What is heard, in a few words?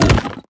wukong enemy elemental death normal